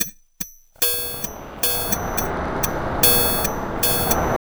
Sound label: tabla, 110 bpm; Zero-G; Pure Tabla